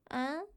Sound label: confused; noises